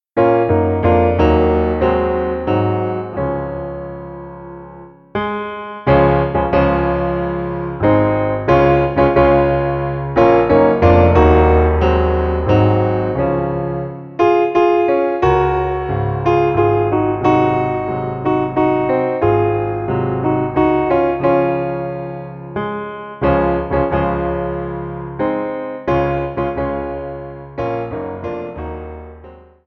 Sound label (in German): o tannenbaum inst; Weihnachtslieder